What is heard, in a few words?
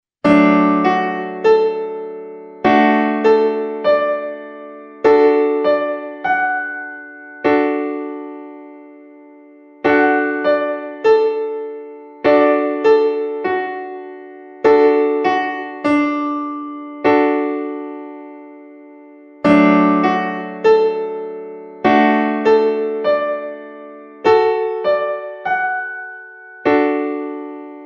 D Piano Keyboard Chord